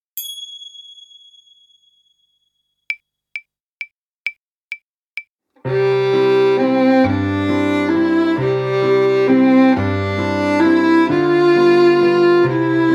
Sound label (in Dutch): Viool